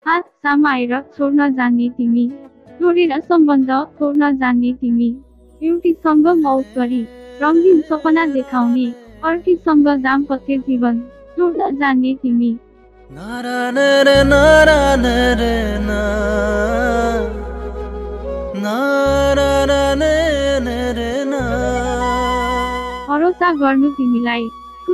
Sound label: nepali ringtone